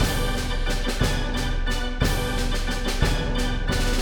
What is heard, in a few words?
Boss Fight Phase 2 MIXED; ben 10; Zombozo